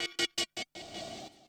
Industrial 160

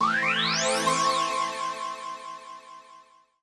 KEY SHOT TEKNO 140 A; Techno2; Singleshots

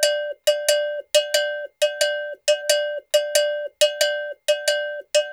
ETHNO EXTRA 90; ethno2

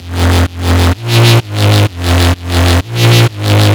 bass 128 Fm; Electro; bass loops; Anthemic

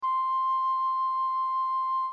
Fluyte, flutes; Real Samples

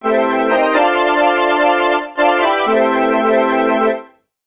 DEEPHOUSE SYNTH 125 A; Keys

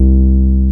TB BASS; TB-303

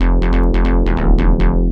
SNTH BASS ELCTR 140 A, Techno

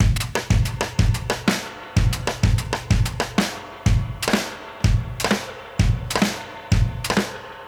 125 Sprung Breaks; Loops; Touch Loops; ClassicBreakSessions

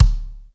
BD ROOM, bass drum; BASSDRUMS

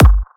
kick, drum hits; Electro; Anthemic

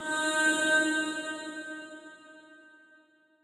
reverse

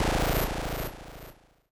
KEY SHOT TEKNO 140 A; Techno2; Singleshots

